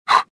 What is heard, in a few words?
land; Star Wars Jedi Knight II Jedi Outcast; XBOX; shadow